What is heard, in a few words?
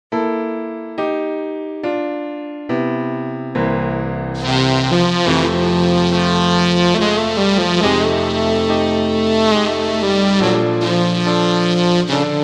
Tnr Sub